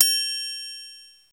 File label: R8 Triangle